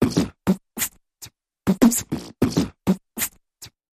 Big Mouth Percussion Variation

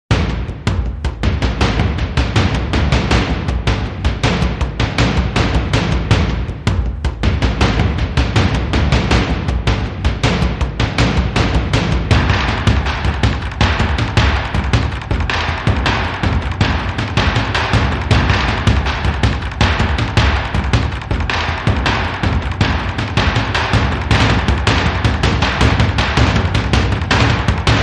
Mid Drums

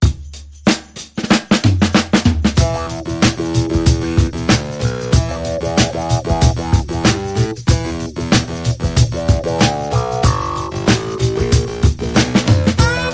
Fire Raising Funk

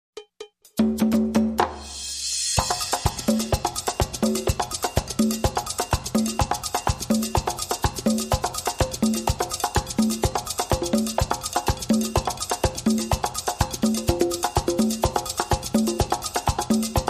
Calypso Loop

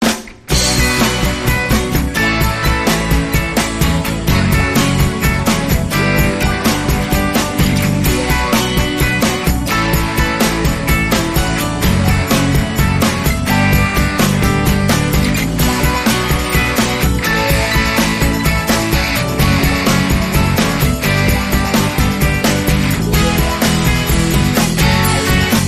Talkover Bed

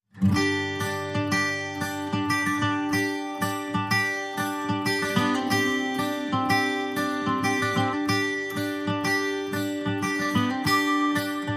No Perc

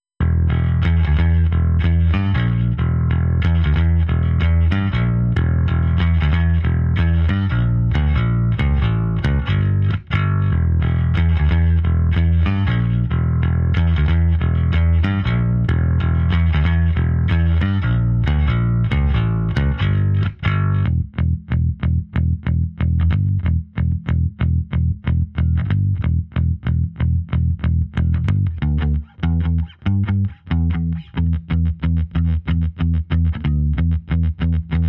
Geirdo, Bass

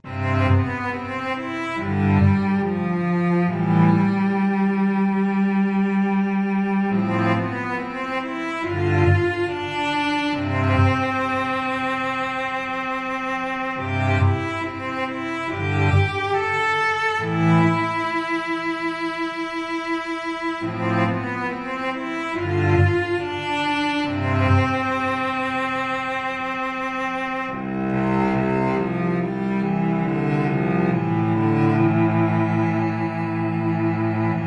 Cello Only

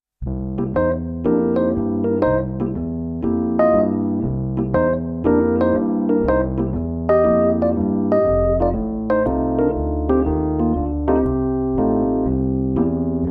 Rhodes

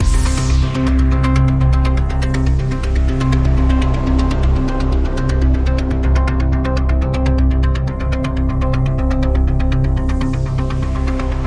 Radio Toolkit Pad

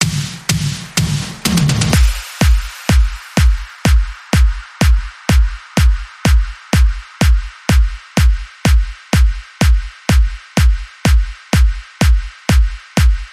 Spring Break Beat

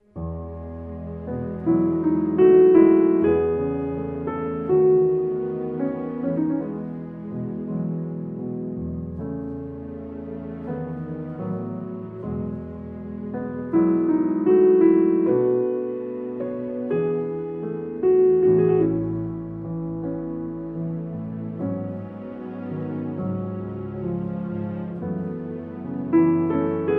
With Strings

